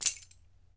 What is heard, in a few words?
BEL RATTL, African Perc; E-MU Producer Series Vol. 7 – Old World Instruments